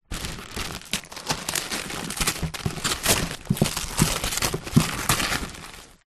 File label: unwrapping present; zapsplat